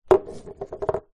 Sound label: food drink empty take away coffee cup down on surface